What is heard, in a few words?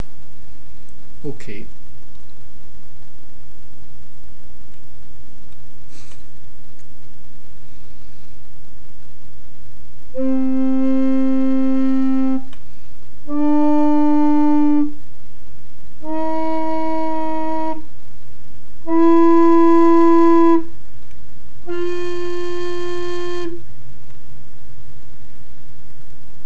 Tenor flute; g3